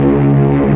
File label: shiphorn tekstep bass